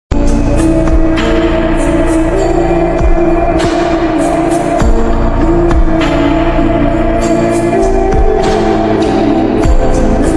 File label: new music ringtone islamic sad bgm ringtone slowed reverb ringtone heart touching ringtone